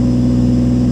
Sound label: Toyota Supra 2020 Low; car simulator 2